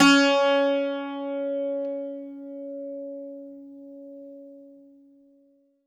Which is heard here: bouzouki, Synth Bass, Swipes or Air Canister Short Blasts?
bouzouki